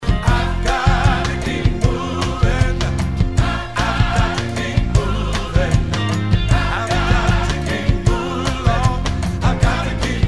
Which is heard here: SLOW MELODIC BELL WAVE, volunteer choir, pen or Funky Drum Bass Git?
volunteer choir